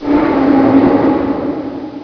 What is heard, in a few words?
metro stop